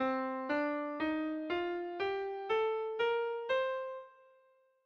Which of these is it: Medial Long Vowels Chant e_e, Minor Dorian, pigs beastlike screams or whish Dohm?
Minor Dorian